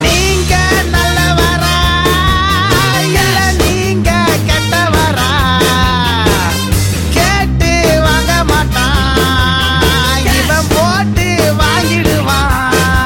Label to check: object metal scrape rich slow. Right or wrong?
Wrong